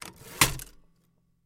Typewriter Carriag; typewriters

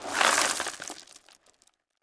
Landscape Rock, rocks & dirt

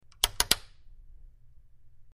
Rotary Dial Turns, switch clicks

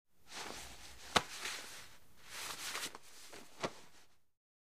Napkin Movement

